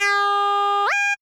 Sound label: Beat